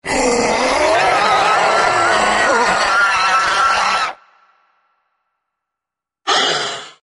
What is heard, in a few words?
screeching velociraptor